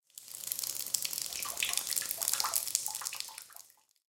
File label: hydrant end